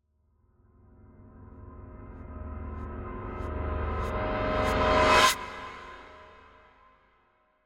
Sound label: Horror Piano, Trailer Reverses; Async Audio